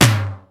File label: Kit Tom; Digital Sound Factory Xtreme Lead